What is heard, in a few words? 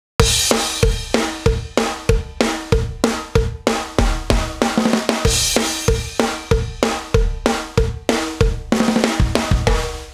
Drum